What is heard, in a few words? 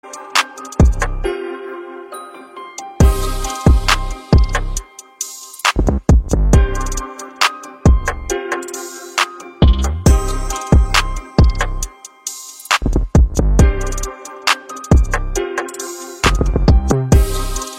Tech House Remix